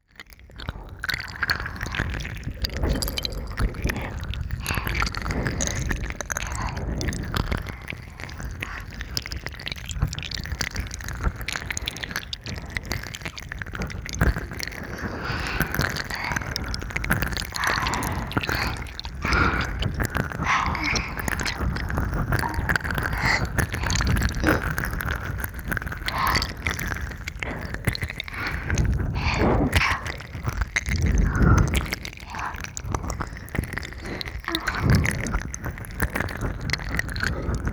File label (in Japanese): 禁断の脳髄舐め&極・耳舐め, ASMR